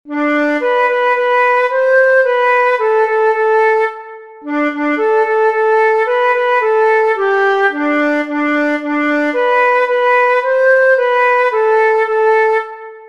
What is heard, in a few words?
Hush Little Baby, flute solos